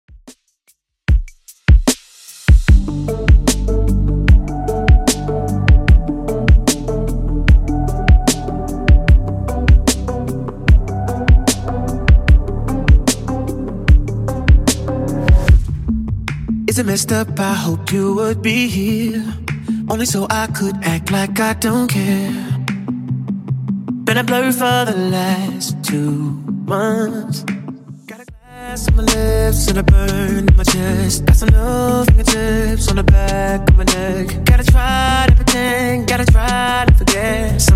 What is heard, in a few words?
Top40 Redrum 75bpm CLEAN